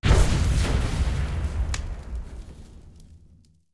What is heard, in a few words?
Explosion Whoosh